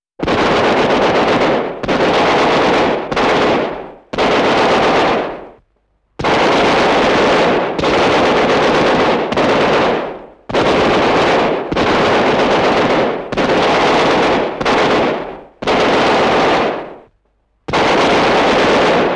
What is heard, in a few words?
Machine Gun Sound